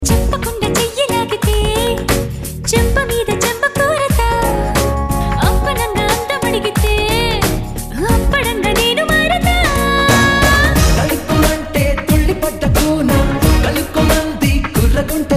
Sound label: Ringtone